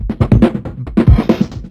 LOOP FX, Big Beat Drumloops

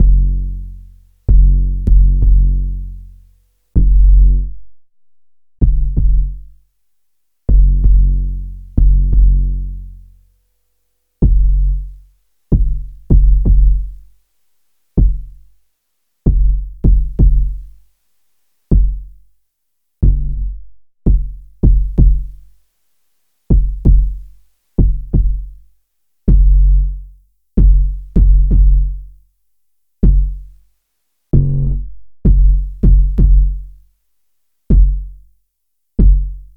Bass High Quantity

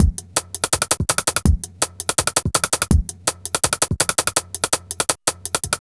Stick Break 165, Drum Loops, Jungle; Jungle Warfare 1&2

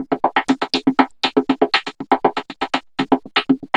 Vocobeat; Sonic Foundry (Sony Creative Software)

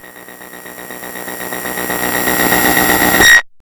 SONAR, Hard & Loud Techno; USB Soundscan vol.01; AKAI

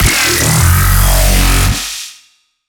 blank growl, bass

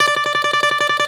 BALALAIKA, Russian Strings

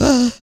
PERC, MALE PERC; Spectrasonics Vocal Planet; Jazz FX

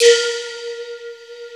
DRONE KB; T1 KORG; East West; Global Sounds

